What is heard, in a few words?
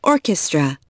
ORCHEST